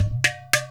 INDIAN DRM, INDIAN PERC; Spectrasonics - Heart of Asia CD2

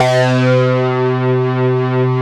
HACKSAW, MEMORYMOOG; Rubber Chicken Software Sample Bank

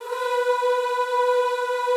B3-L, Classical Choir; Best Service; Extended Classical Choir

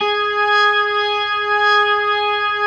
G#4S, B3, Vintage Keyboards

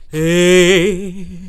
FEM, Hip Hop; E-MU Formula 4000 Series Vol. 1; Hip Hop Nation; Phatt Phemmes 2

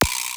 DR-660 DNC, DRUMS PERC; BOSS; Rubber Chicken Software Sample Bank

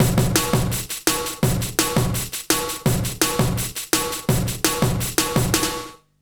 166 BREAK, Explosive Jungle; USB Soundscan vol.05; AKAI

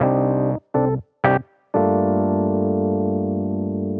Jazz Keys El Piano 120 CA, Chordy Loops, 120bpm

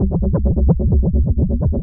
Noise, LFO & Noise

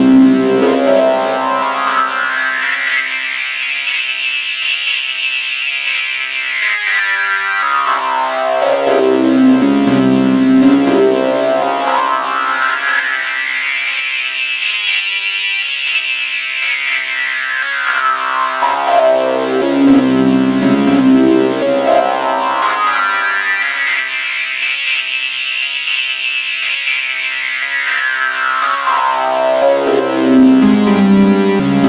NRG Sweep, Trance

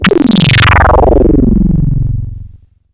SYNTH FX

